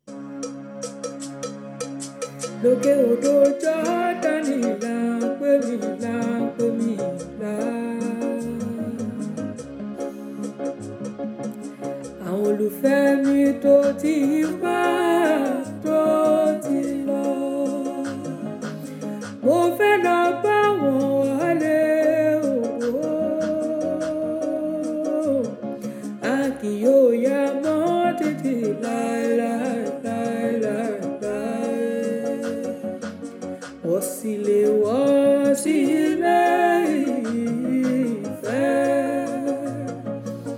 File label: Hymn; Labisi Loke Odo Jordani; C S Hymn 807